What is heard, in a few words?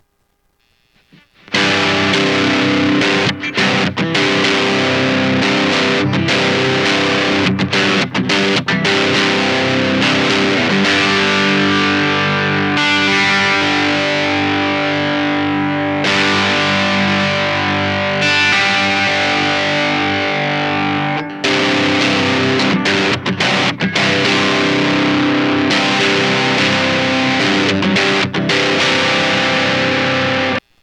Tele Bridge, pickups